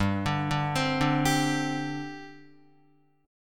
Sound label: G Suspended 4th, Guitar; arpeggiated; 4F,4A,5C,5G,5C,6E; JGuitar